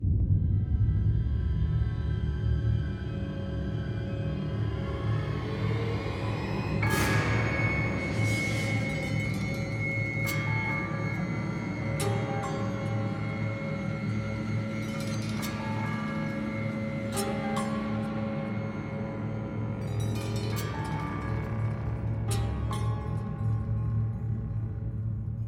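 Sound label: alert orchestra; the walking dead survival instinct gamerip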